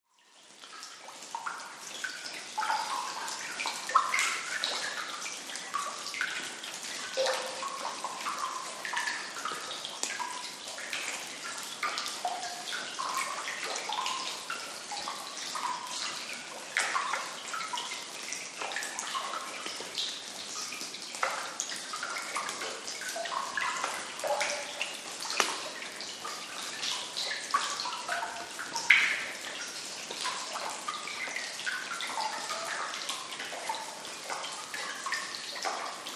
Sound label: Cave Drip Grotto Echo; drips